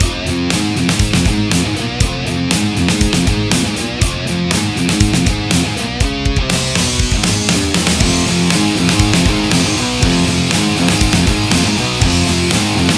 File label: Heavy Riff (looped); Heavy Riff 4